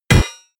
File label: hit metal hammer large